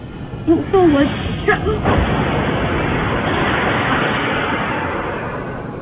fireballs

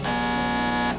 ringin

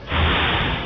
woosh; tunisia

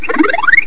censor

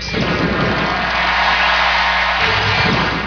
door

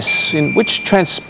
ting

